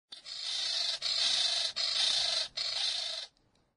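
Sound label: Lidth's Jay, amami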